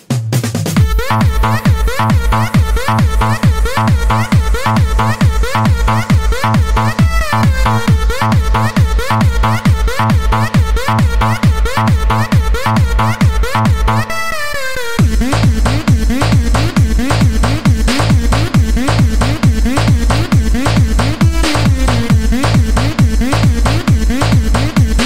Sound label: hindi ringtone; english ringtones